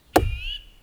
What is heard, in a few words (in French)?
Sec Eclair, Percu Bois